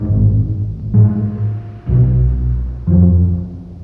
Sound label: bass, Loops